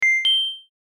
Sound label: success; BlockSpace v1.5.0b